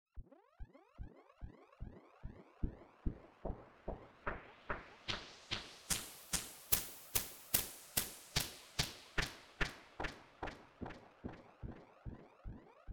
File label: Pink Noise Effix Delayed; Techno